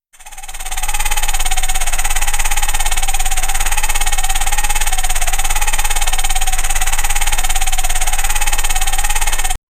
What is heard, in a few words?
cyborg running sound